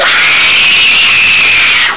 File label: scream